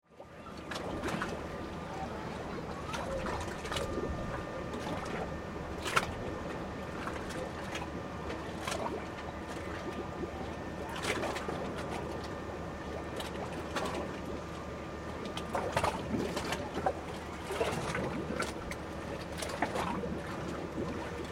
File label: Ambience Dock Cruise Boat Waves; Boats; Vehicles; SFX Bible 6